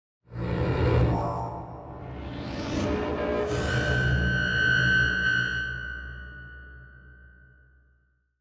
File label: Electrical Feedback Front To Rear To Front; Electrical Feedback Distortion Front To Rear; 5.1 STEREO MIX; Blastwave